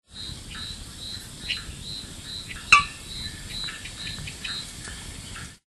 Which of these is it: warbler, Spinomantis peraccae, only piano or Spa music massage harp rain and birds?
Spinomantis peraccae